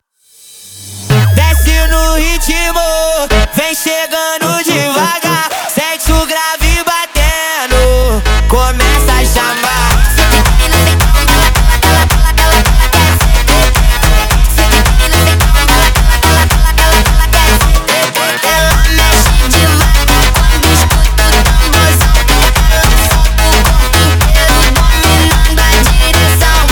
Phonk, Sped Up

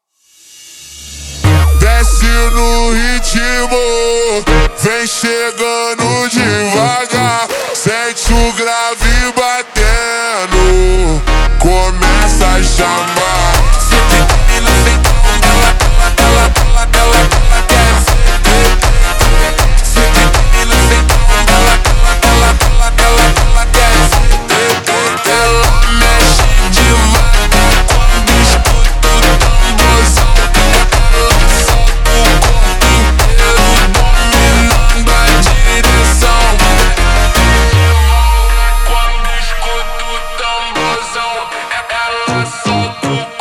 Phonk, Ultra Slowed

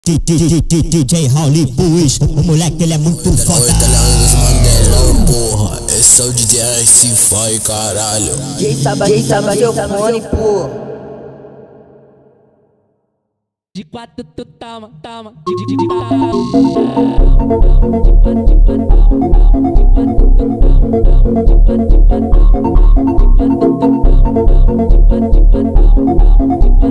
Phonk Chill

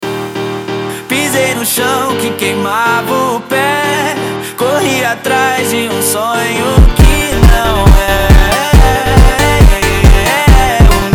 Phonk, Sped Up

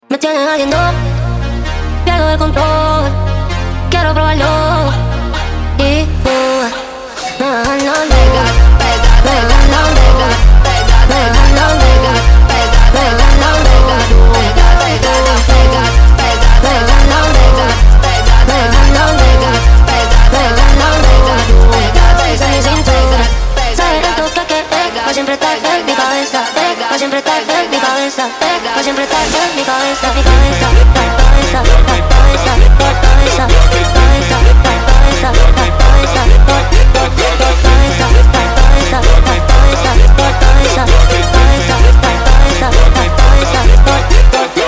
Phonk

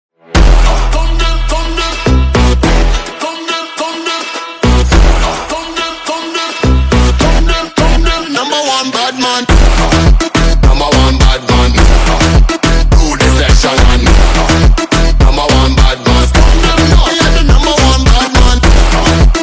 Phonk

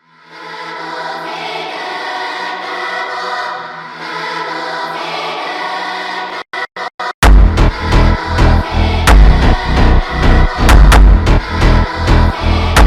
BULGARIAN FUNK

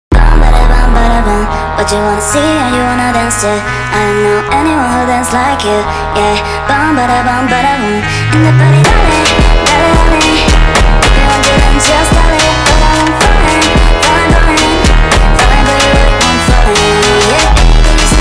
Phonk

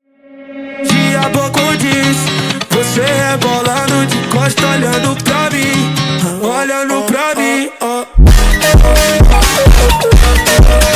Phonk MONTAGEM

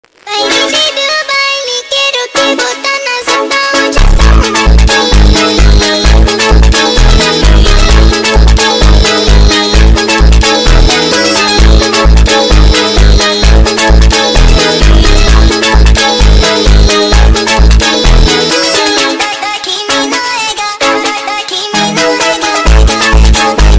Phonk MONTAGEM

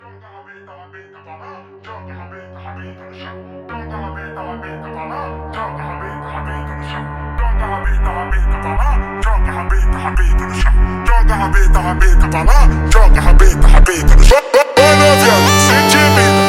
Phonk

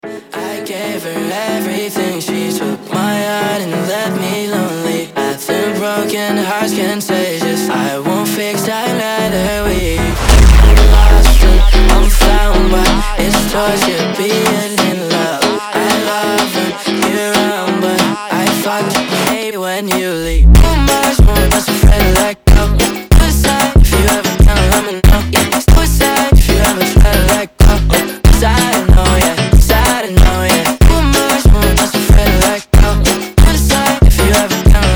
Phonk FUNK Slowed

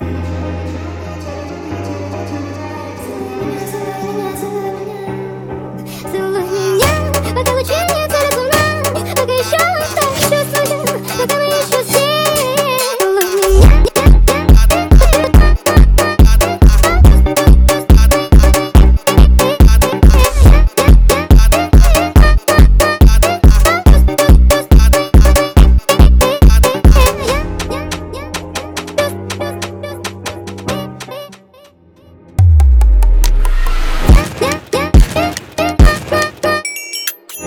Phonk Sped Up

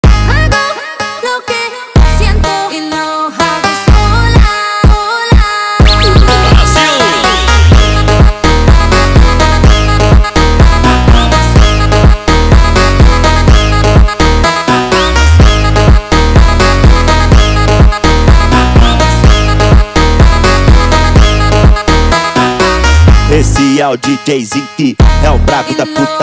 Phonk MONTAGEM

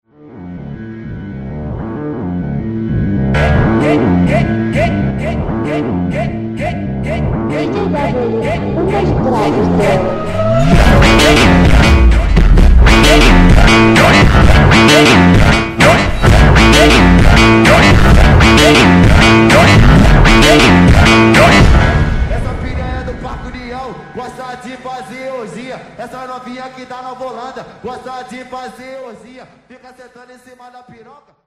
Remix Beat Distorce Pancada

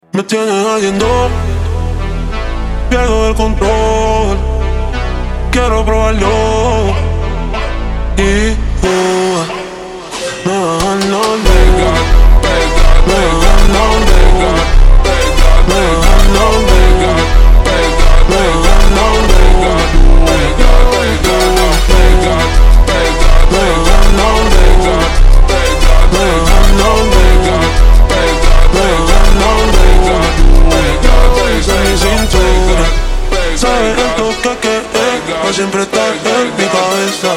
Phonk, Ultra Slowed

